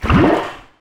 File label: paint blob; Portal 2; paint blob inception